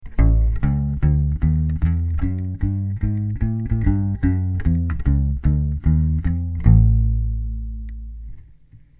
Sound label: piezo, bassuke